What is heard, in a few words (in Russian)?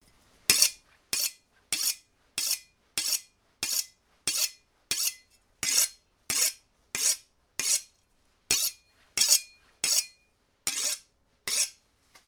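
recording of friction between sharpener and knife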